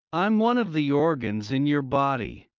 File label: Heart low; Classroom humanbody